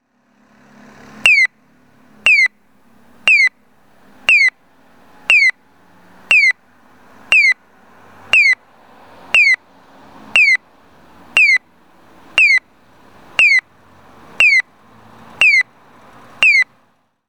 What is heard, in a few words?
piyo; nisshin EA9101D; kurayoshi; kajimachi 2chome